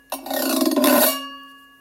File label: Pipecleaner